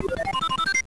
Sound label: Warp; SNES; SMALLSTARS